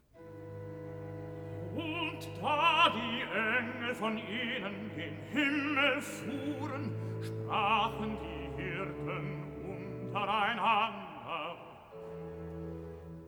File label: Recitative; Cantata #3; Und da die Engel von ihnen gen Himmel fuhren; Bach; Christmas Oratorio, BWV 248; Ph. Ledger